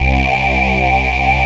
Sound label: VOX PULSER, DANCE; Pro-Rec